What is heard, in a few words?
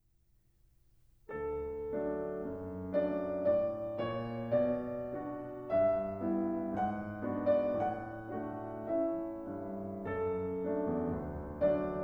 Horn and Piano, Moderato E Poco Rubato; Sonata for Horn and Piano Op. 24